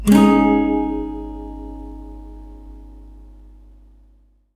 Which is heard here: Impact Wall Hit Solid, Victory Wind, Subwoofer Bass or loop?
loop